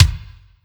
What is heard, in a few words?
drum hit whistle; osu